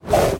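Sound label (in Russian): свист брошенной вдаль спасательной веревки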